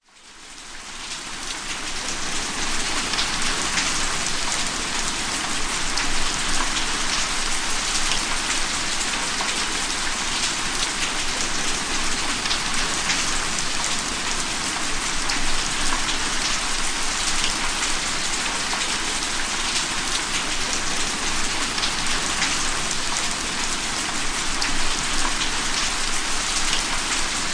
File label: rain; zapsplat